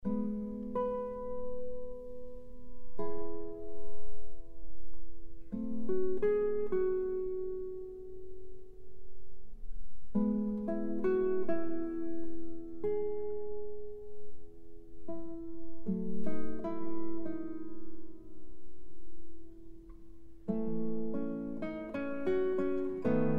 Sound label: Guitar; Claude Debussy; Suite Bergamasque L. 75; III. Clair De Lune